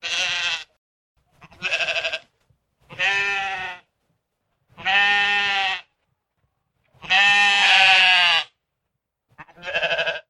Sheep Sounds; RailPro

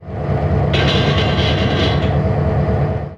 Handbrake Release; RailPro

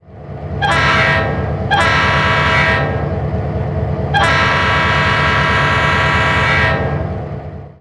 Leslie RS5T; RailPro

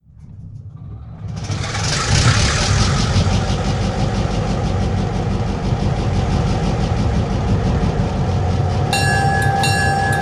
EMD 567-16; RailPro

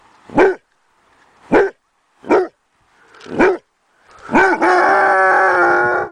Large Dog Barking; RailPro

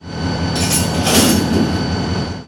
Coupler Clank; RailPro

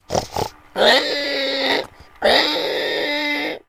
Pig Sounds; RailPro

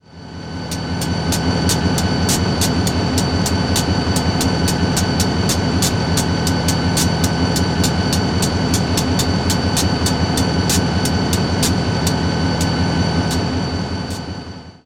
Fast Spitter; RailPro

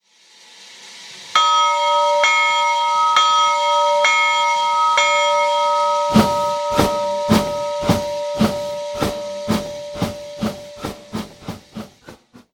Bell Challenger; RailPro

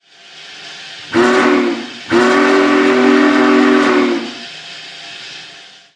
Big Boy; RailPro

